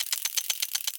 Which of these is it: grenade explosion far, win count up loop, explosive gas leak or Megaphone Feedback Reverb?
win count up loop